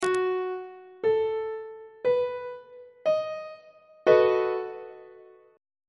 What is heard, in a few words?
c flat dominant 7th 2nd inversion on treble clef